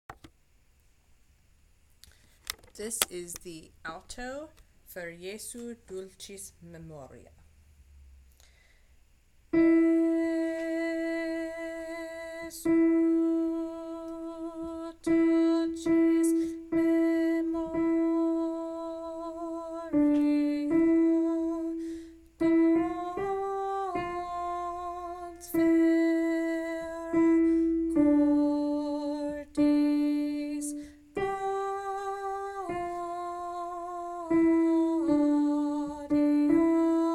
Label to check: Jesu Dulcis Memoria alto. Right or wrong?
Right